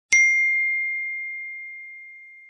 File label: apple iphone; apple iphone 13 pro